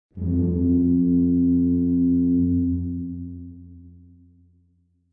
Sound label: Horn Mild